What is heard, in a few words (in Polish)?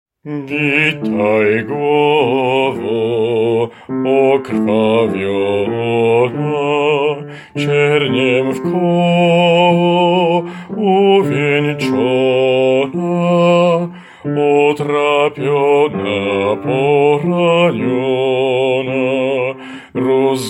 BAS; Witaj glowo